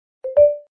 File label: new Msg